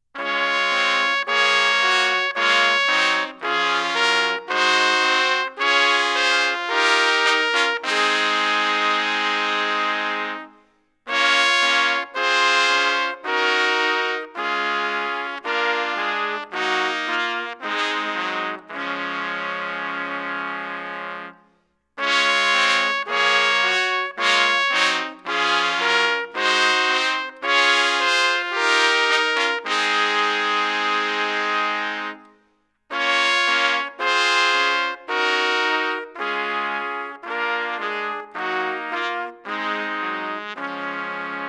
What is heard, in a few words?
Chorale Bach